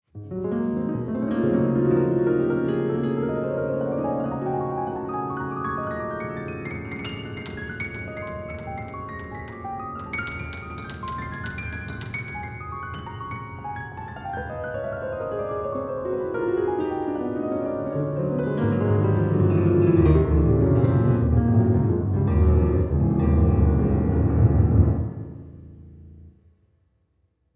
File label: improv on the sustaining grand